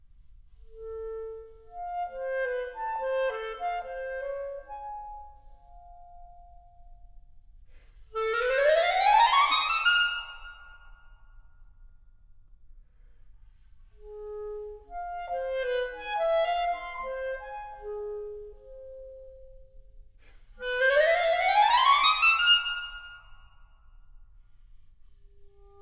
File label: Cadenza